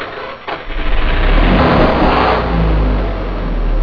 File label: ENGINE